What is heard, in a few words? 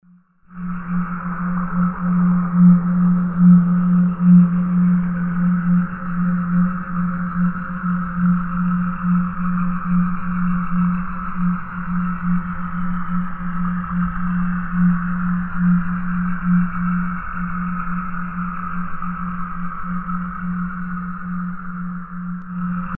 creepers